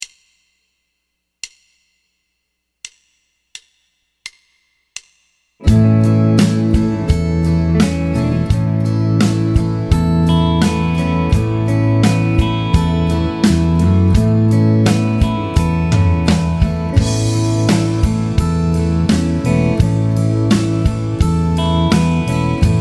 Triad Vamp MAJ; Triad Vamp A Major; Piano Practice Tracks

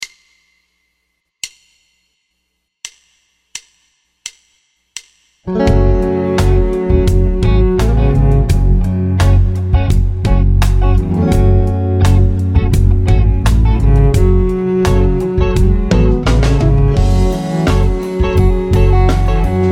triad vamps; triadvamps 44 min gb

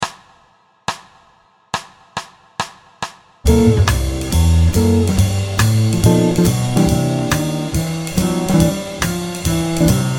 Blues Swing C 140

